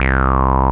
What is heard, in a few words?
BASS, Analogon; A-BASS 2